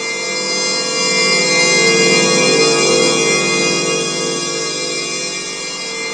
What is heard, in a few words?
A#3 laser; Northstar; The Wizard VOL-1; FLUROBELLS